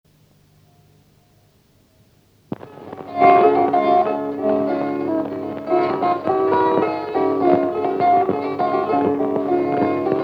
sarvelugu, kannel, folk; Vandra; Saarlase sarvelugu; Hans Dreiman

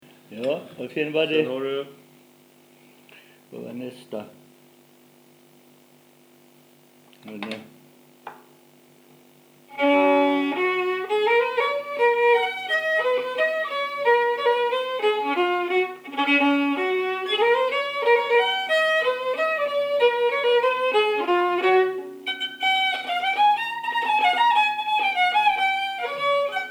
vana pulmavalss, viiul folk; Ruhnu; Gammal bröllopsvals

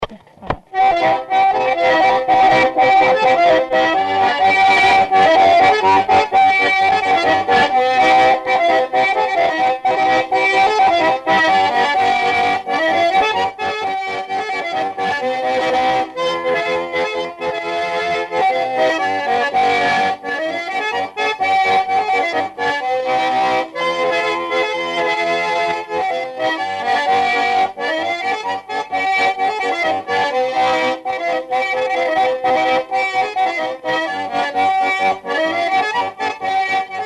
Marupolka polka loots folk; Paistu; Holstre polka; Hans Aavik

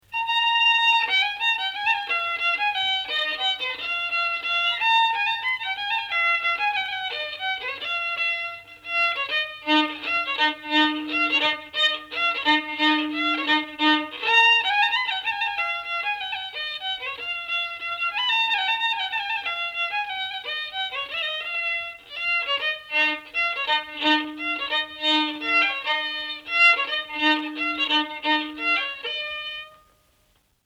Pulgatants, viiul folk; Ruhnu; Paldansen; Elias Schonberg

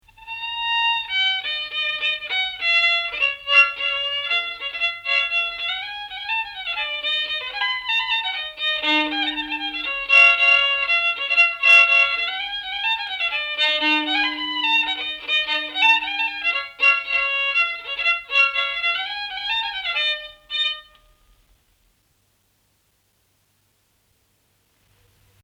Ruhnu viiul folk; Nananana; Elias Schonberg

